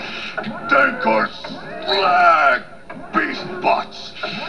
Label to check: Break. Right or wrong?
Wrong